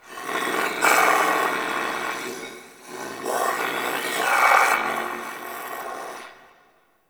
Sentient Lair Interior Creature; Misc Creatures; Ambience; Warframe

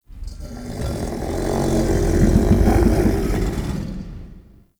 Pirate Kraken Call Ambient; Warframe

